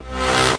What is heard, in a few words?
horn; Horns and Brass